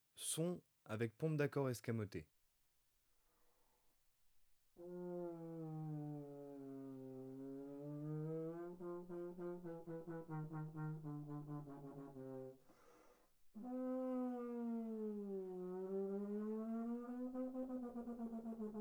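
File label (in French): sons avec pompes d'accords escamotées, trombone; Textures; Sons sourds; traite orchestration; trombone tenor trombone basse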